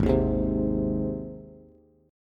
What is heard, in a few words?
Ab Major, Bass; strummed; JGuitar